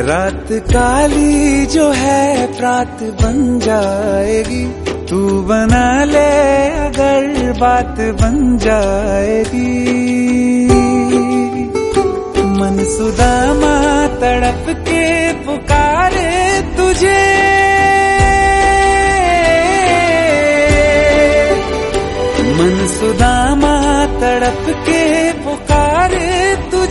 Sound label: bhakti song ringtone